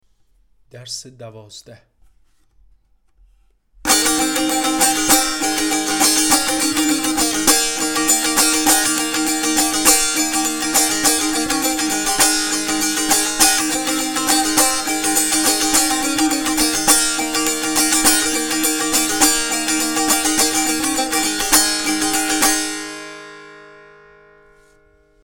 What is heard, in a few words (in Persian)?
مشق تنبور; تنبور جلد دوم; هفتاد و دو مشق تنبور